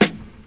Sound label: 2000s snare sound a key